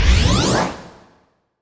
burst discharge change, weapons

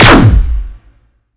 burst discharge, weapons

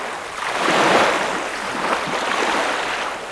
megalodon run; Boss Megalodon

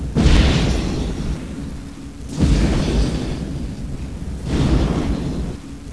boiler; zs rendezvous

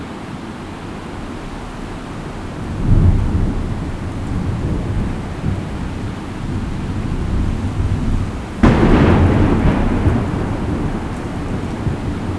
thunder rain; zs rendezvous

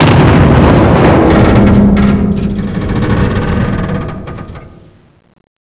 boss impact; zs rendezvous

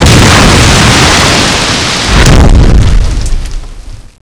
megalodon jump start full; Boss Megalodon

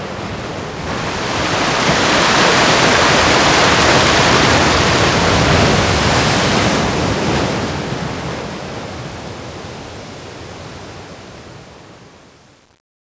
submarine rise; zs rendezvous

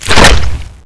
megalodon attack; Boss Megalodon

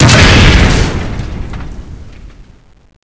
explosion machine; zs rendezvous

